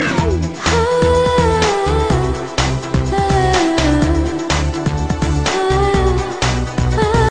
Bengali SMS ringtone

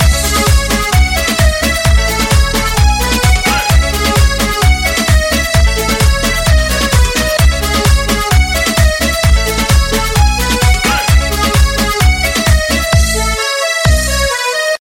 bomba latin ringtone

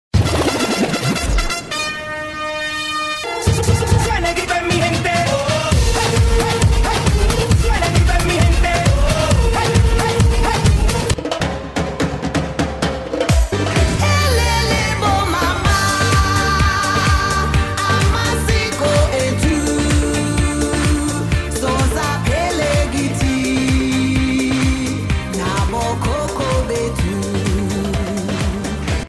electro dance latin pop ringtone

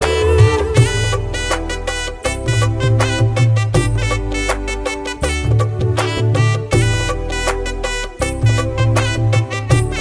vimaanam background music

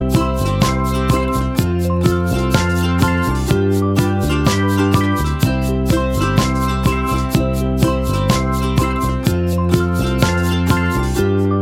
beautiful tune ringtone

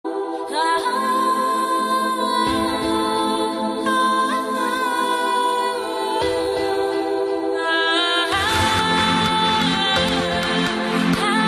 arabic trance vocal

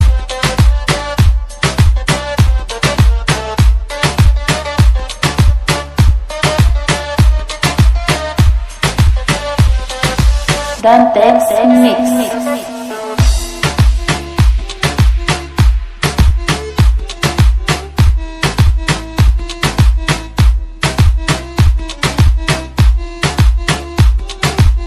new arabic car ringtone

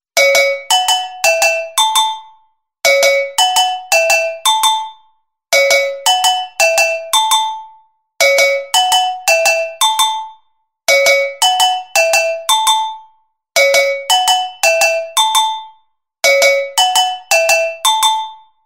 agogo

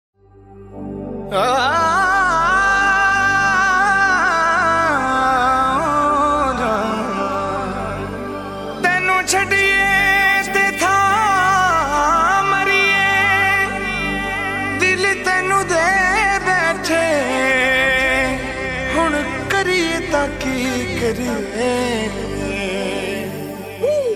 new punjabi indian

